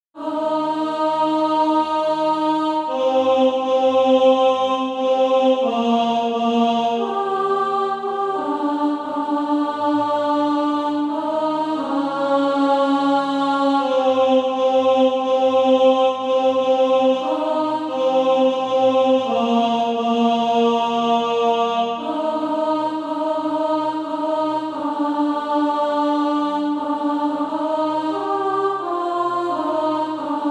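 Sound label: alto, Practise Music; Deep River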